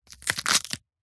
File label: horror bone break small crush fast; zapsplat